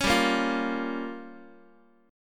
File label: G Minor 7th Flat 5th, Guitar; Gm7b5 strummed; JGuitar